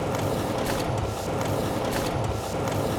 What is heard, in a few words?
PHOTOCOPY, OFFICE; AKAI S-Series CD-ROM Sound Library VOL-6